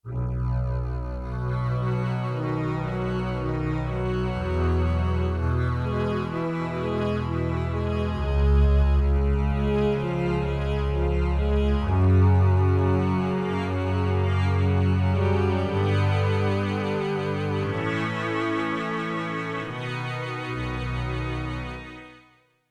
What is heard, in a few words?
Chorus, Bass & Cello, Yamaha TX16W